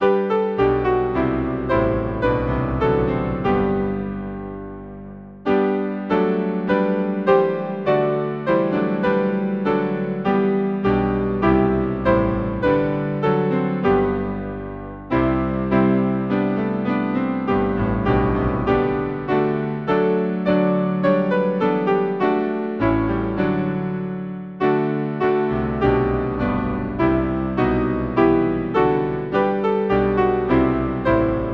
Hymn piano; Lead us heavenly Father; Dulce Carmen

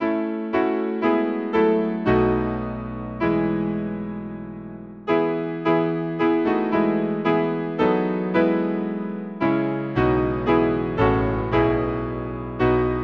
piano, Hymn; Come ye faithful raise the strain; St Kevin

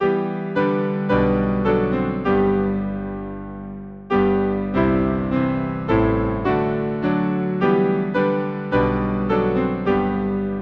Hymn, piano; Immortal invisible God only wise; St Denio

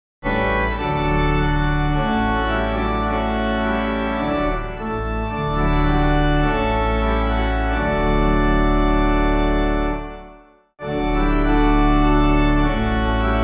organ, Terra Beata; This Is My Fathers World; set to Terra Beata cm

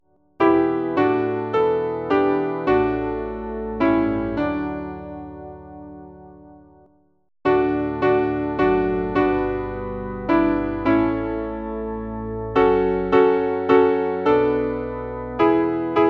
Hymn piano; Breathe on Me, Breath of God

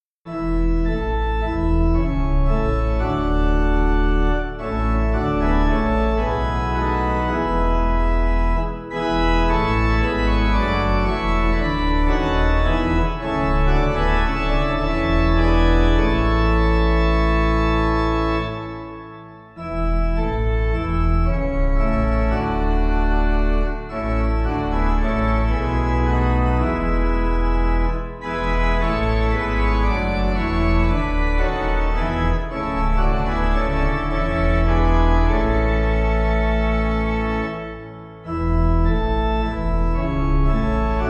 Pipe, hymn; Rise Up ye saints of God; FestalSong; Hymnal 1982

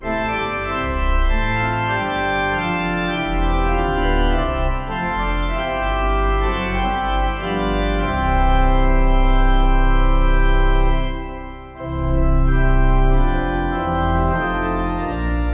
organ, hymn; mckee; Hymnal 1982

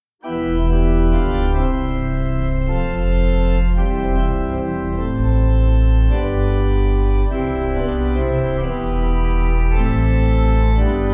Hymn organ; Morning has broken; Bunessan

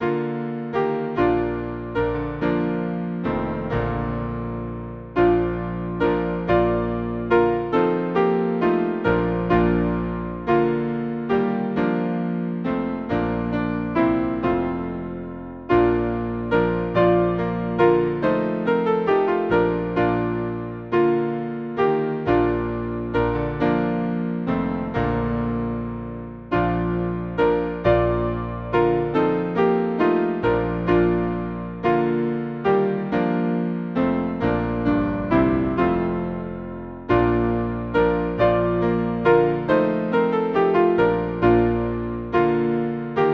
Hymn, piano; In the cross of Christ I glory; Rathbun

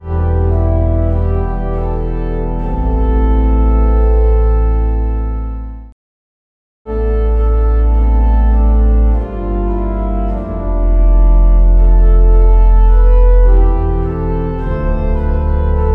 organ 3vs; jesu dulcis memoria; Hymnal 1982

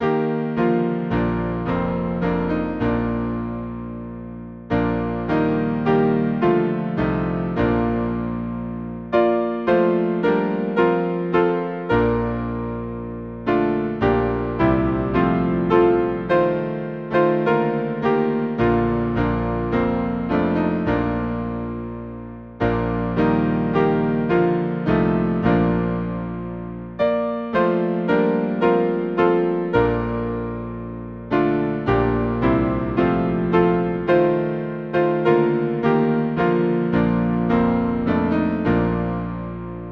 Hymn, piano; For thy blest saints O Lord; St George

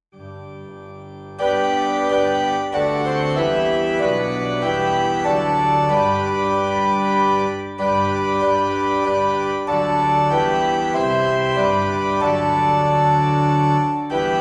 Hymn, organ; From all that dwell below the skies; Old 100th

